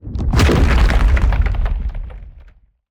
heavy rock roll

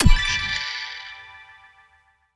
p atk harpbow

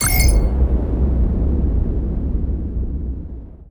ui harmful

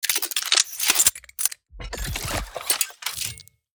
dismantle gun large

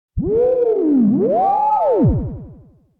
magic float

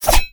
atk sword close stab

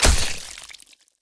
shotgun

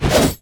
hatchet

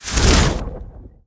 p atk firespear

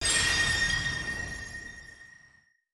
skill spark light boost

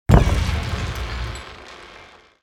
open door

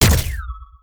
gunshot

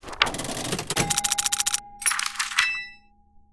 ui net income

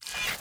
snakeknife

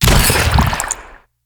waterbubble

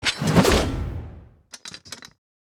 swo skill whoosh

